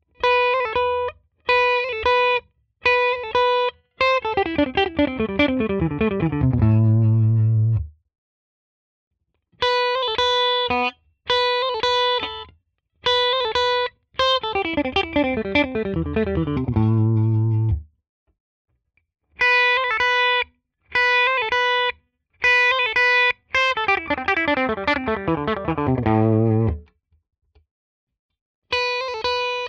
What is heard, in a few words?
Schecter BANSHEE MACH 7 clean Lick all positions